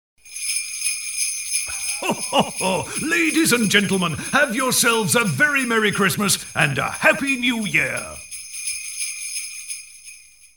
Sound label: E Christmas Jingles